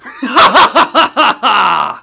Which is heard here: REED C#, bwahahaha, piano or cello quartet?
bwahahaha